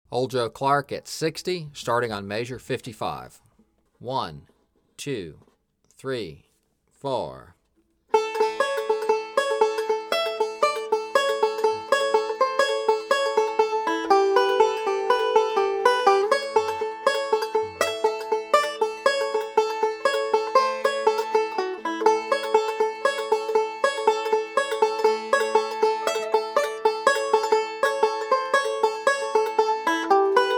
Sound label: Old Joe Clark Break 3 2nd Half, 60 Bpm